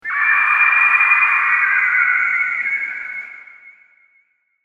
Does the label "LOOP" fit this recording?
No